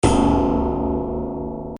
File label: Piano String